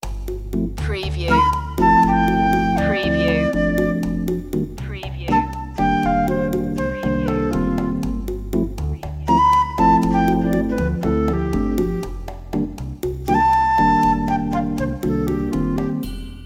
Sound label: latin lope